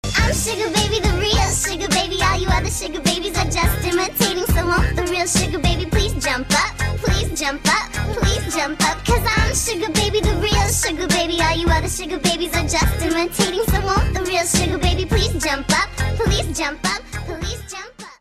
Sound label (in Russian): для звонка на телефон